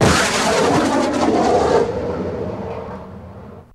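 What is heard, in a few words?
Space Station Thrust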